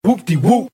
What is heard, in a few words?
whoopty woop; ooh ahh